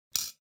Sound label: Click